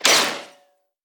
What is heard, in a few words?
damage hits explosions, Warships